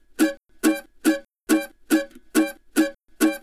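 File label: Country Mandolin Pre Bridge; Digidesign; Pro Tools Essential Loops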